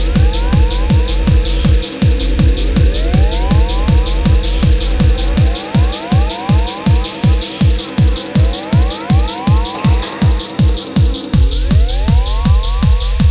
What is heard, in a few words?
Chaotic Beat Remix